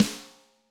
Snare Hard

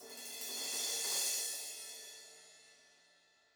Crash Flourish; cymbal; apulSoft apTrigga2; Multi-Velocity Cymbal Samples

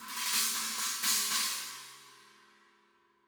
China Flourish; ZKCC 17; apulSoft apTrigga2; Multi-Velocity Cymbal Samples

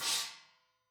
China Choke, cymbal; SAA XCC 18; apulSoft apTrigga2; Multi-Velocity Cymbal Samples

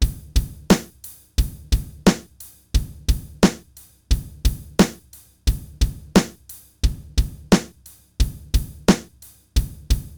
Drums; deadfoot drum training

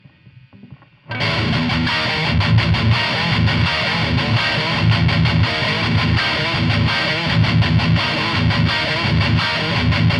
riff

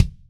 gretsch kick, rock drum samples, Gretsch Maple; D6 API UA; apulSoft apTrigga2

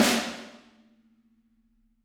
Snare Hard

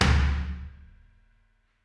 Kick Hard, Drums; apulSoft apTrigga2; Steven Slate Drums

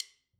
stick click; apulSoft apTrigga2; Multi-Velocity Cymbal Samples

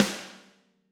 Snare Hard

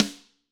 Snare Crack

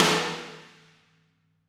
Snare Crack

